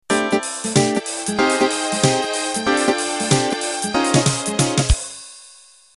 Swing Rock Intro

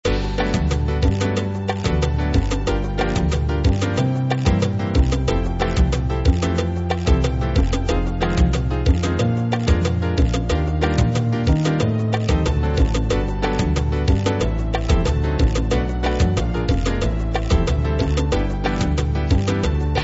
jazz mambo

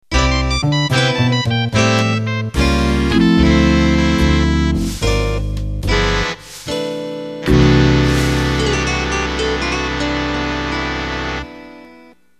Slow Jazz End

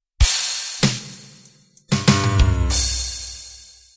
love ballad intro; Love Ballad X & Love Ballad Y compatible MIDI file Intros and Endings

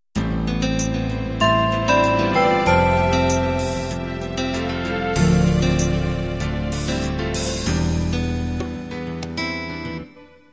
guitar ballad intro

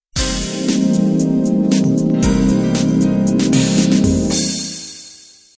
8beat analog intro

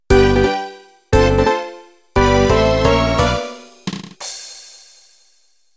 Viennese Waltz Intro

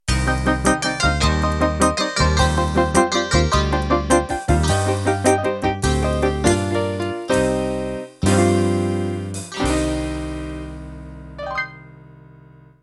Rockabilly End

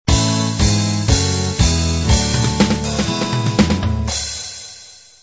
uk pop intro

